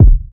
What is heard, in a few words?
tight kick D key